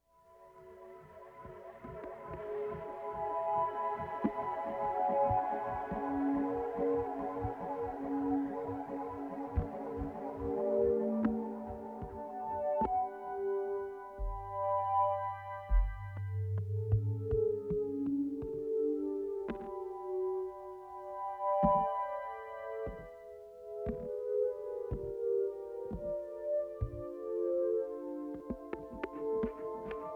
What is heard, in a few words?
Gravel Truck